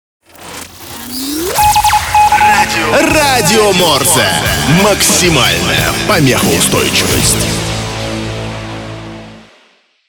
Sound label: jingle